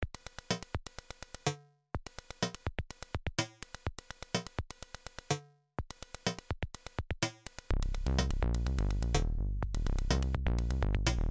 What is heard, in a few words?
turbo sound fm, pulse